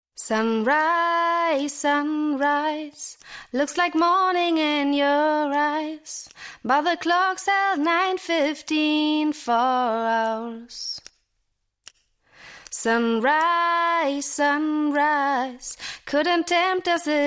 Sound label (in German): lied